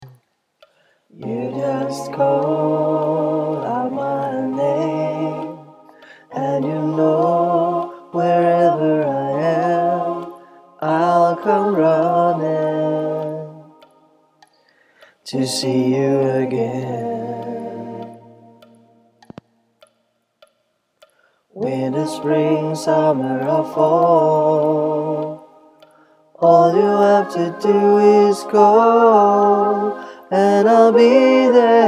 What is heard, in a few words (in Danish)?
BAS